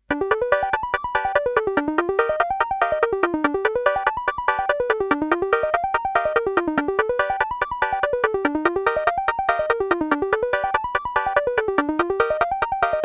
arpeggio sound ringtone

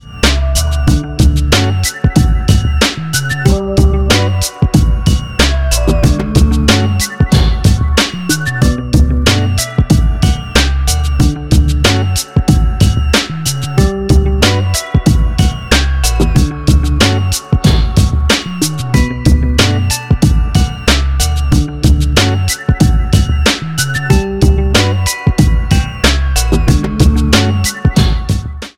happy vibe ringtone

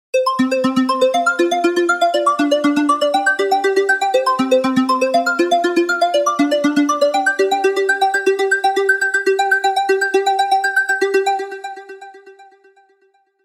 retrowave ringtone

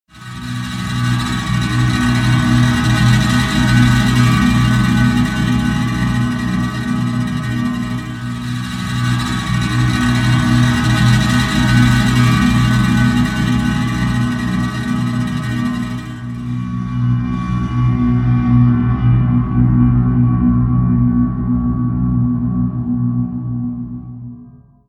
scary background

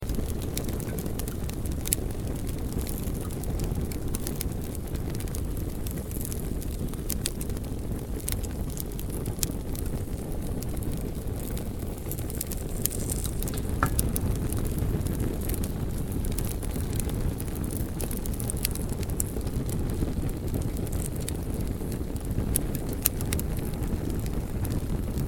fire sound effect

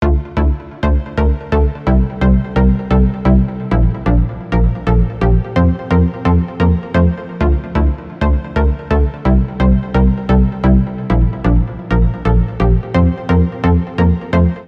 synthesizer translation ringtone

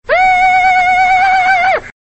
turtleman call

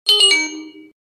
notification sound; life360

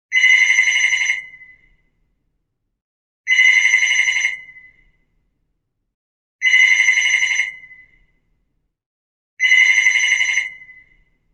old home phone ringtone

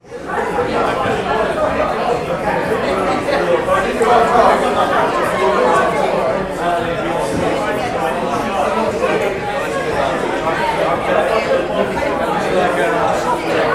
pub atmosphere ringtone

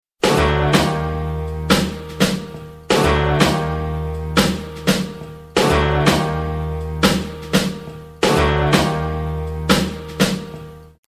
ringtone

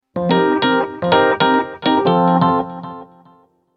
electric piano ringtone

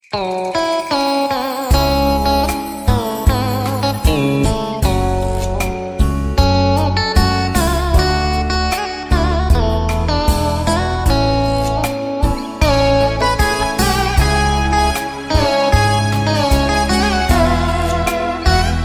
cool indian music ringtone

